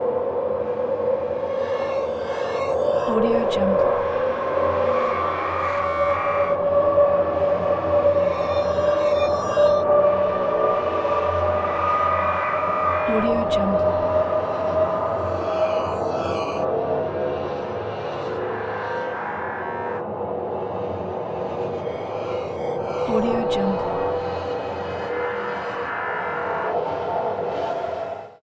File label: time drones particles; ahyperborean